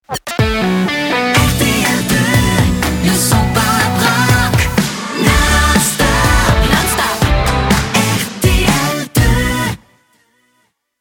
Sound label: Jingles; PURE Jingles; RTL 2